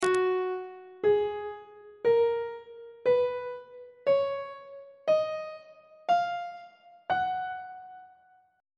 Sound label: f sharp ionian mode on treble clef